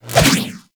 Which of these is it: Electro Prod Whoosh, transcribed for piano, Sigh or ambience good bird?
Electro Prod Whoosh